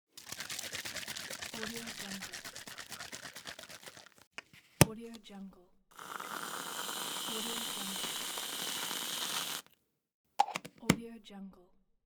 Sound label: spray cream